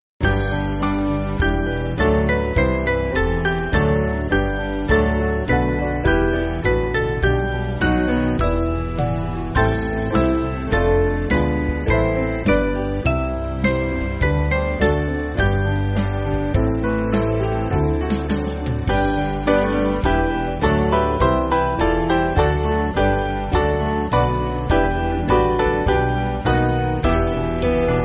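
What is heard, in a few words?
Band, Backing Files for Churches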